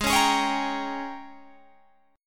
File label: Ab Major 7th Suspended 2nd Suspended 4th Ab, down, Guitar, Open G